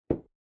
footfall boot step; soundscrate

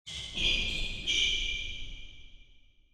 basketball sneakers

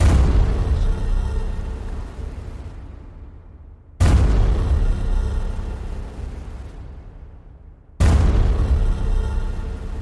apocapyptic trailer rise

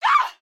woman injured scream

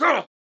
man attack light; soundscrate

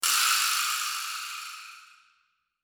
dying rattle snake

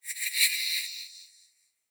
Shimmering Magic Spell; SoundsCrate

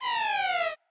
scifi weapon power down

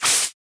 snake attack

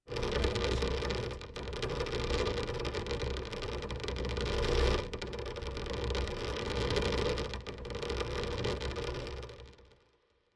horror creak; soundscrate

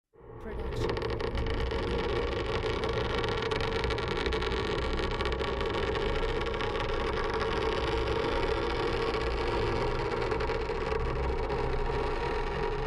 horror scrape; soundscrate

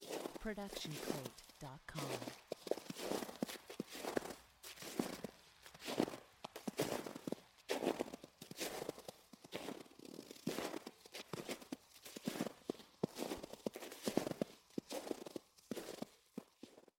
Snow Soft Walking; SoundsCrate

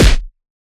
Anime Super Punch

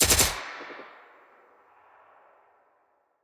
Rifle Woods Reverb; SoundsCrate

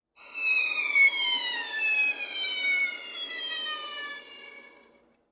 Violin Seagull Effect

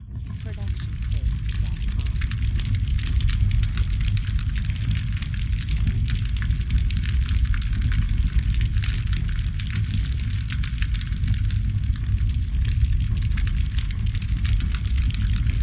Large Fire; SoundsCrate

